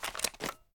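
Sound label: weapon foley assault rifle reload empty end; weapons; gmod; viper; scharlie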